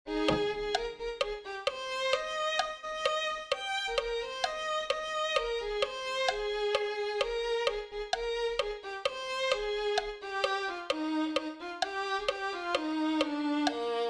mi corazon se fortalece en ti violin

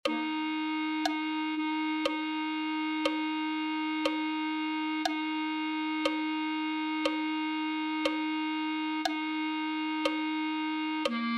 espiritu del trino dios clarinete

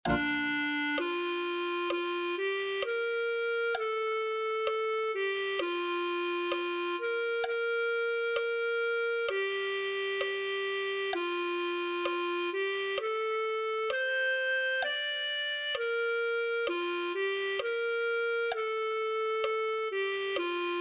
santo la tierra clarinete

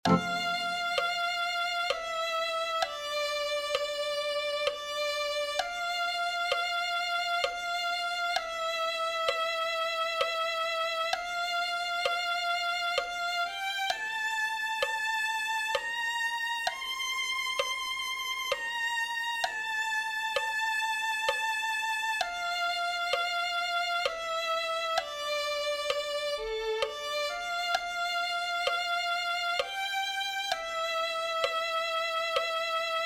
cristo amante de mi ser violin

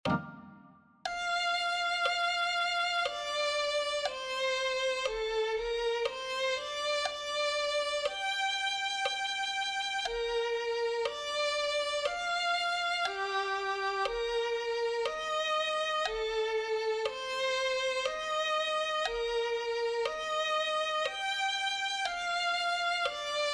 santo espiritu ven violin

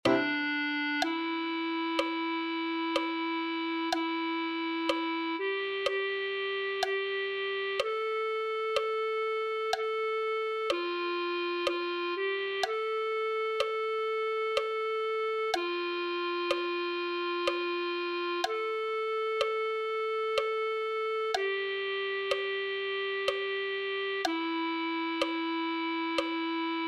clarinete

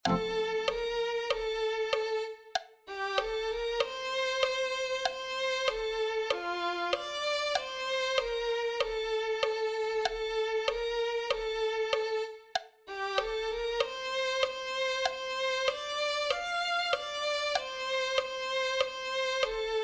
guiame oh gran jehova violin

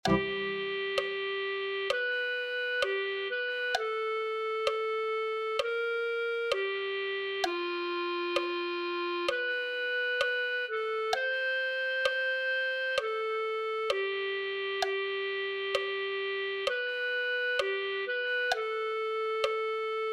clarinete1

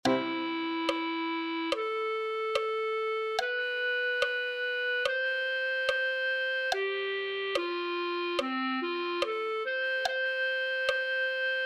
santo santo santo clarinete

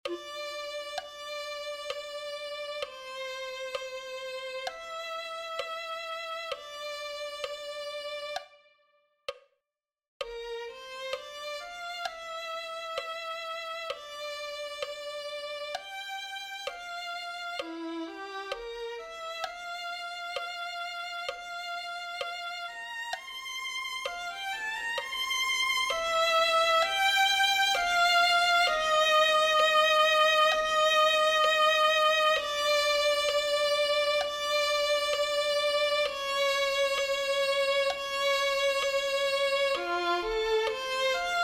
rio de dios violin

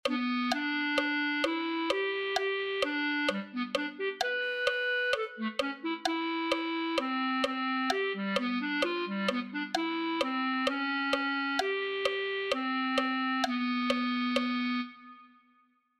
clarinete

